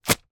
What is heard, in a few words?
tape rip remove from mouth gagged; foley